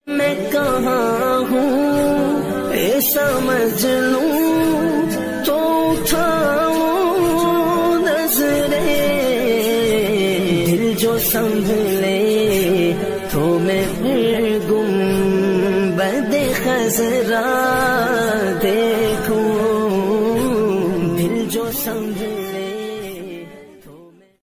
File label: Naat Ringtone